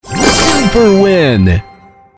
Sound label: super win